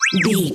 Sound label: ui menu button beep